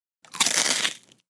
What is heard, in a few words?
Dry Cereal Dry Crunch